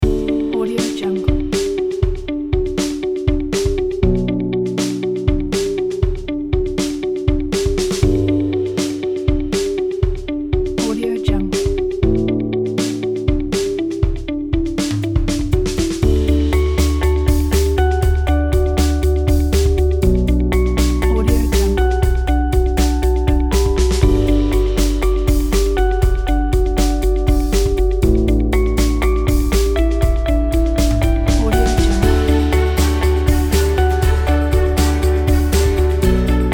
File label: motivation